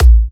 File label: Kicks A; markus hakala